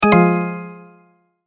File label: multimedia tone error